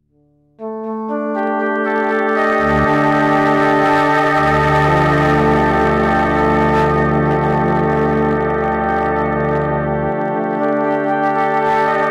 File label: flute recorder bell partials